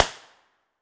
smg uzi

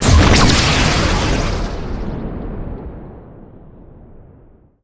fire; c gun tower

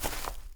grass run, footsteps; chief

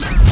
slide; Nintendo

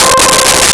Bass Lead C5; Nintendo; July 2020 Gigaleak

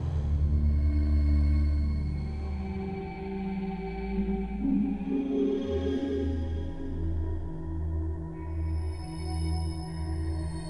spooky, loop

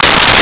Skate Stop; Nintendo; July 2020 Gigaleak

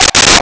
Bongo; Nintendo; July 2020 Gigaleak

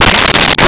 gatling

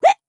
death violent, grunt involuntary; covy involuntary

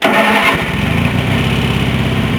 logo; Nintendo; July 2020 Gigaleak

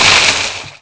shot

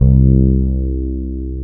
Fless bass, c2; BGM; Nintendo; July 2020 Gigaleak

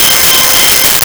Draw Bar Organ C6; Nintendo; July 2020 Gigaleak

